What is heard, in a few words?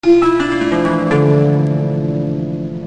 Jap Harp; Music